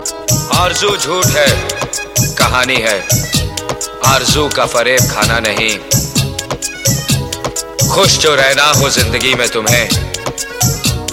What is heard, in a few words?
Shayari Sms